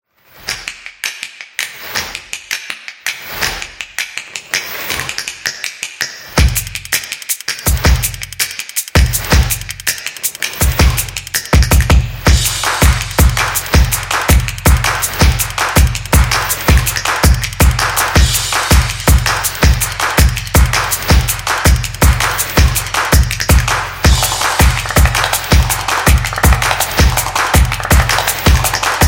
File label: Drum Dynamic, percussive; percussive nation vol 3